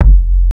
KIK

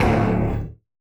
Hit

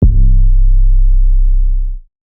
808's

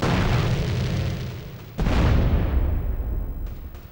Rocket Launcher

